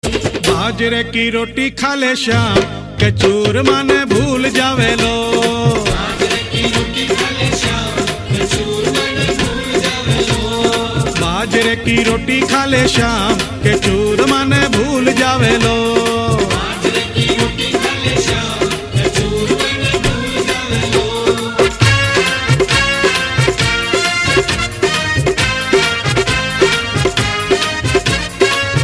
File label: Marwadi Bhajan